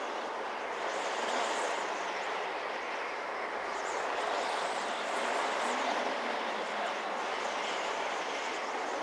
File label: wind; Mafie 1